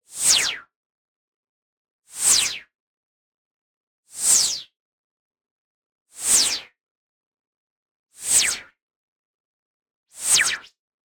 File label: WHOOSH White Noise Short Resonance Descending; boom library; Anime Essentials